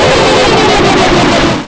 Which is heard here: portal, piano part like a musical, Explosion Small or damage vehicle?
portal